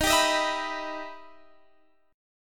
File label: E Minor Major 7th D sharp